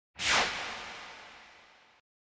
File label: Beam Rifle